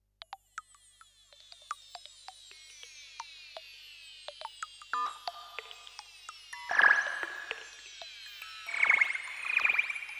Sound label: RM Pitch Env